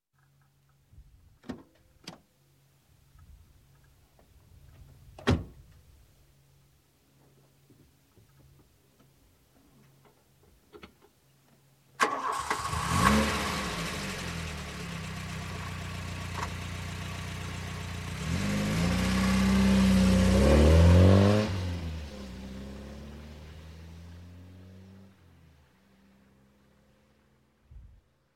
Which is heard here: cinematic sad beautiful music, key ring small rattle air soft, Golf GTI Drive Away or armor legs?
Golf GTI Drive Away